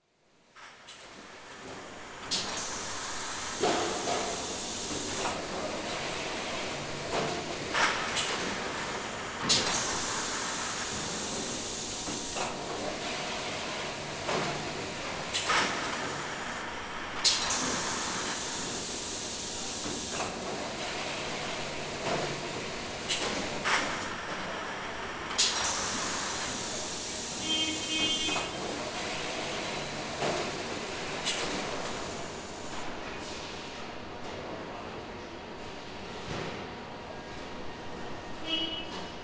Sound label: tyre factory floor factory ambience pneumatic decompression two forklift horns; different tones